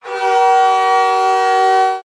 Goal Horn; MTL

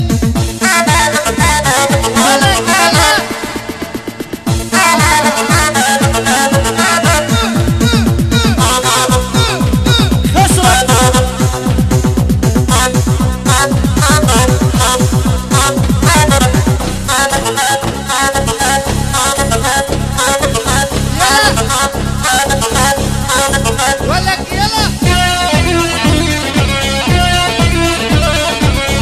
Arabic

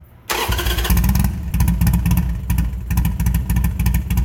Harley short start

